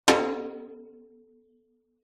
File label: impacts metal drum thin light resonate